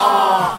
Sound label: ah group of men; Voice; ooh ahh